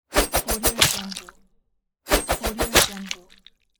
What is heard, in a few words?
dagger throw on flesh with blood